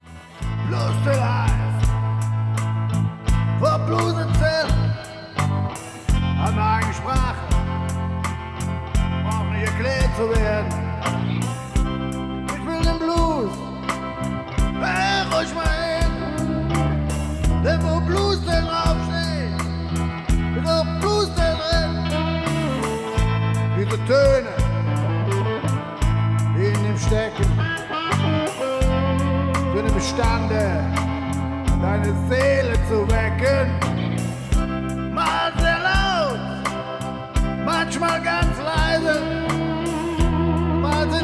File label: Blues